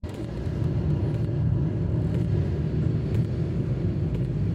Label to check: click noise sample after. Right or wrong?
Right